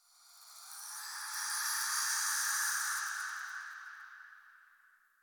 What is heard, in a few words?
Wind Spine Grass Scream Gust; Ambience; Misc Outdoor; Warframe; Infested Eris